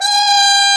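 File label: brass plunger trumpet, plunger mute; Roland L-CD702; Plunger & Wa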